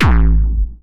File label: 00s present kick drum single shot a key